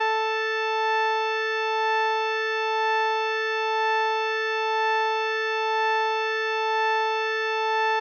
oktave 440

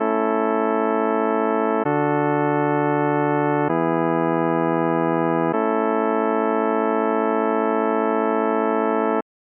kadenz rein

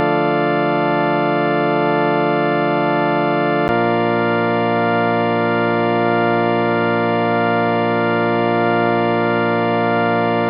d moll b dur

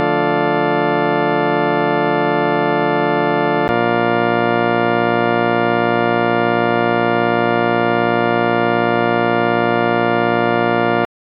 d moll b dur rein

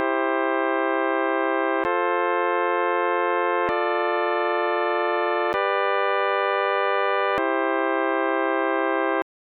zweite Stufe C C C

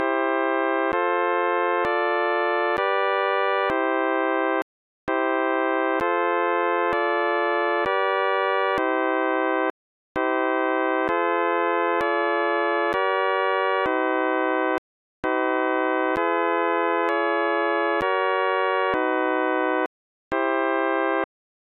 zweite Stufe Kommafalle

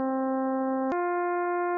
quart

